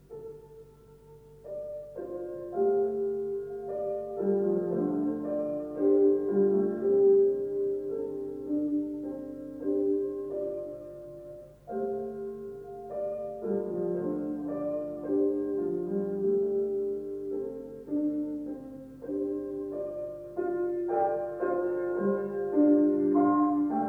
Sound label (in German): klavierstucke wiegenlied